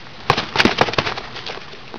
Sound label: push box, debris; cstrike